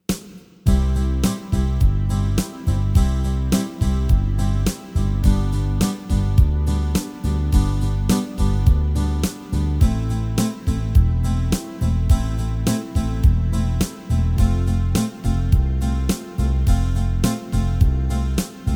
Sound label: C I V VI IV; degres diatoniques